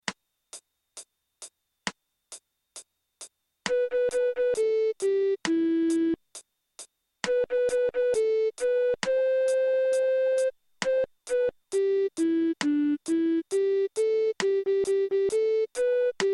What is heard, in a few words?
Blue Melody Only